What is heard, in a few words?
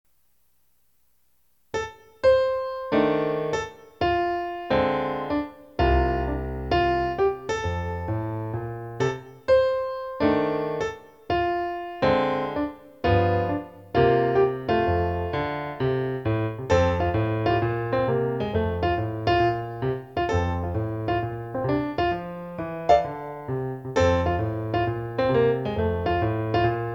jazzy ballade